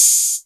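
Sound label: Hat Open, Novation Drumstation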